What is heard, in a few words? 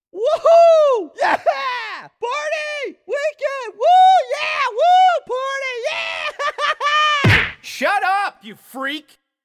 Woohoo Party